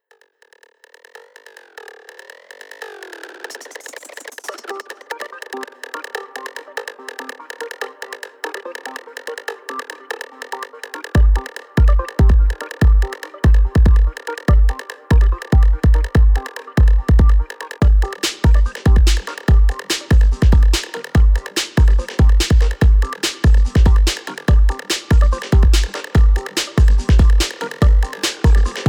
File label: Rims & Cowbells